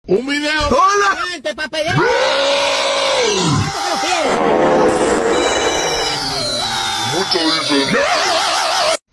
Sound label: rawr goofy sounds